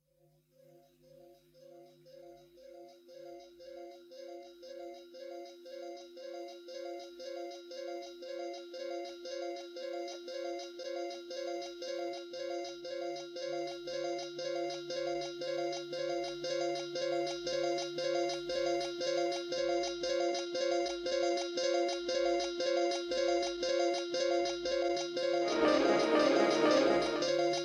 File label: instrumental